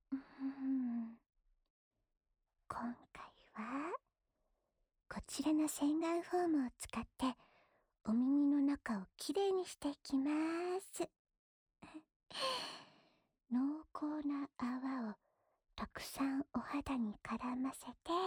泡沫按摩 左耳, ASMR

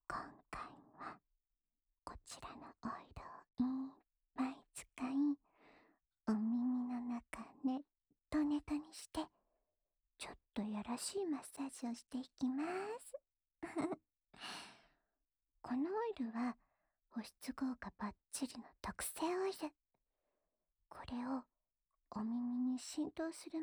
精油按摩（右耳）, ASMR

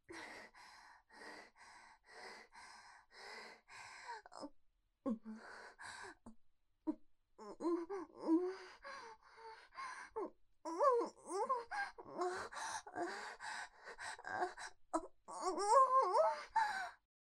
琪希琪露高潮前娇喘（短）

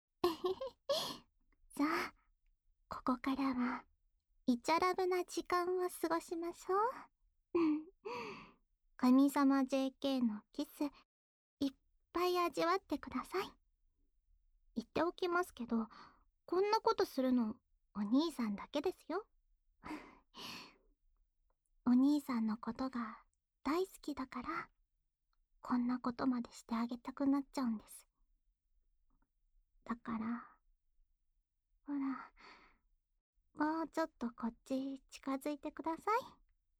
温柔的接吻

